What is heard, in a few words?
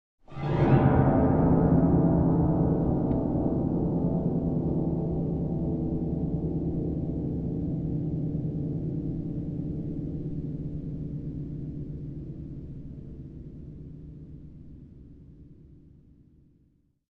Dark tension building horror sound effect